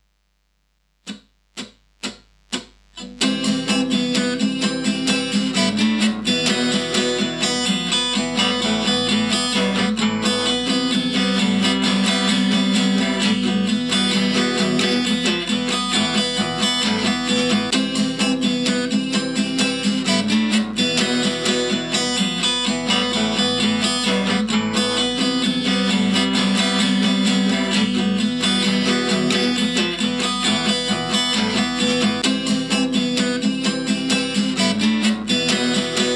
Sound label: Acoustic guitar; REMIX KIT